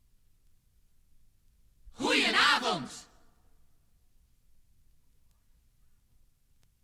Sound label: topformat maxi jingle single